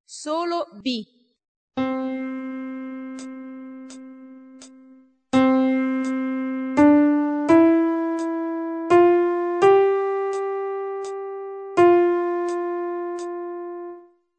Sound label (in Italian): ritmo 6/8 solo